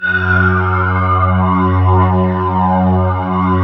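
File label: synth Morpheus; Sound & Vision - Gigapack II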